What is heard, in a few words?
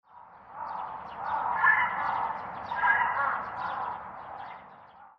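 hashiboso, Carrion Crow; sapporo; koe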